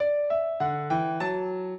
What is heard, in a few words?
piano; Mozart; minuet